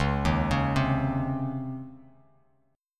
C sharp Major 7th Suspended 2nd C sharp, Bass Standard; arpeggiated; chord; 9,6,11,5